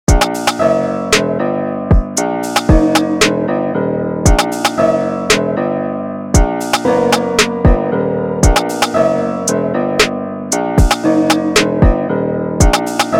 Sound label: Broken Piano